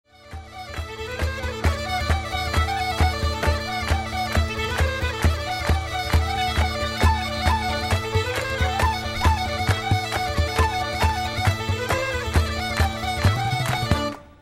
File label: Gaelic Reels